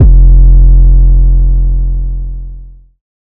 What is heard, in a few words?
808 classic F, Tonal; Hip-Hop; Slate; CAICOS Hip-Hop Sample Pack